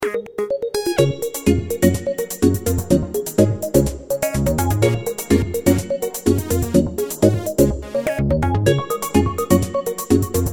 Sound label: start round, music